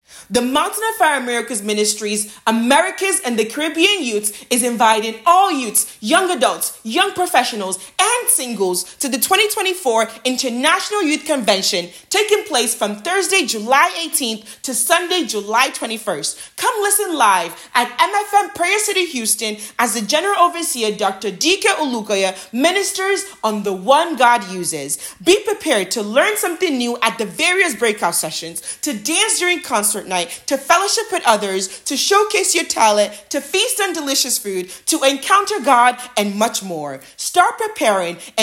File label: jingle